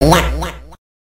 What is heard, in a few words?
noise